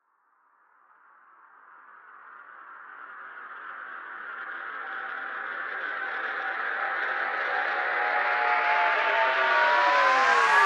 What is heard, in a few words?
Whoosh